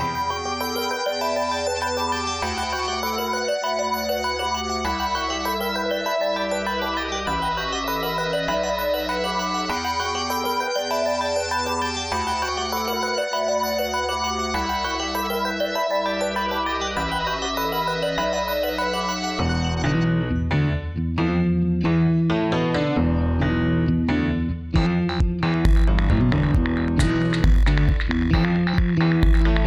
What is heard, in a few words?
guitar